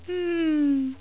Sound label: wrong